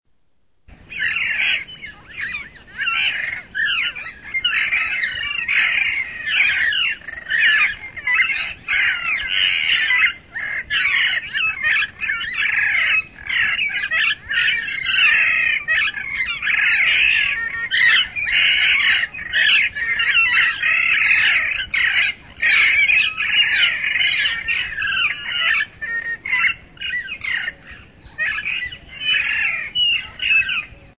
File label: alexandrine parakeet; birds